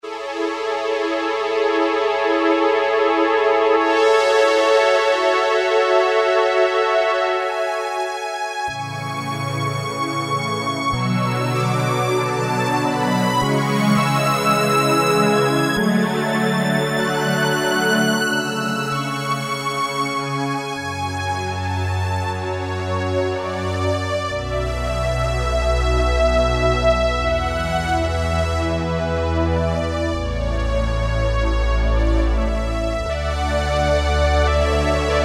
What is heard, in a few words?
Slow High Strings, Roland MKS-70